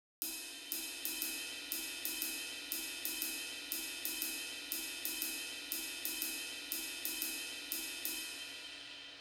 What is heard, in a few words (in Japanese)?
シンバルレガート; drum-yougo